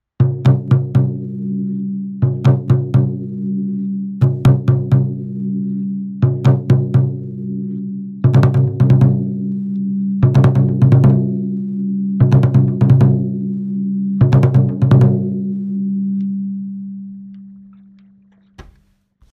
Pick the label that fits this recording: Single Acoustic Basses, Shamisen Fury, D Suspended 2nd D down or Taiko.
Taiko